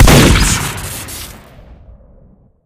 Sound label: revolver shoot crit; tf